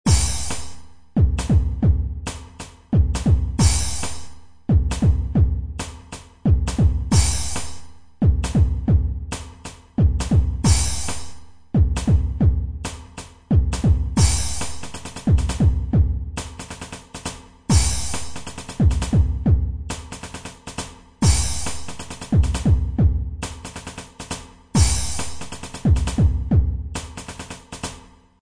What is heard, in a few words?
drumloop